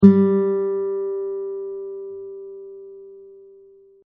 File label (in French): sol, accordeur guitare